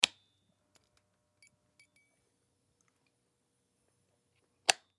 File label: Black Light Turn, Black Light Turn On Flicker Turn Off; Switch Sound Effects; Objects; Foley